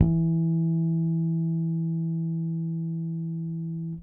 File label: Harmonic E, Bass; Bass X